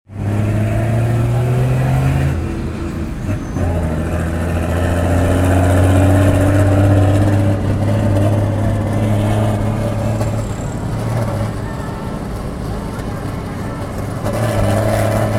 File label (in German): Lima Verkehr Stinkebus gibt Gas